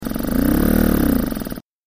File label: Piston Pump; Motor Revs, Low And Fast With Chugs